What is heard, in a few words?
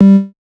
reel stop, slots; garrysmod; blues-slots